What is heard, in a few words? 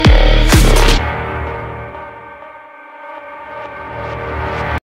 outtro music